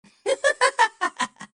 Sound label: spy laugh evil; Adachi